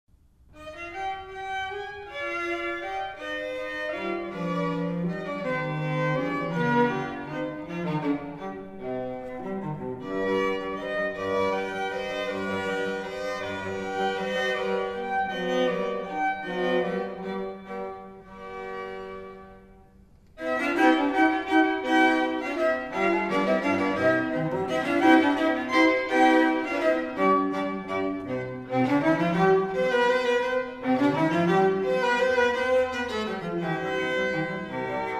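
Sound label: quartet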